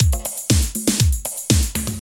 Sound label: 120 bpm old school pop drum beat d sharp key, kick tuned to the D# note